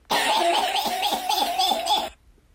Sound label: cough